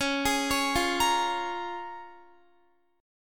Db 6th Db, down, Guitar; arpeggiated

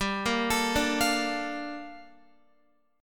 G Minor 9th Guitar; Gm9; arpeggiated

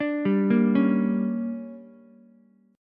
D Major 7th Suspended 4th Sharp 5th, Ukulele; DM7sus4#5; arpeggiated; JGuitar

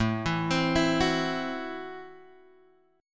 A Minor 6th A Guitar Open F; 9,11,12,11,9,x; arpeggiated; Am6

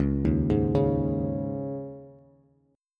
C sharp Suspended 2nd, Bass; arpeggiated; 9,6,6,6

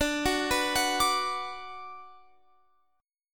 D 6th D down Guitar Open F, chord; D6 arpeggiated

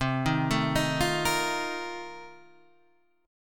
Bb Major 7th Suspended 2nd Suspended 4th

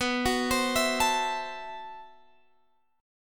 A-Minor 6th B, chord; Am6/B; arpeggiated

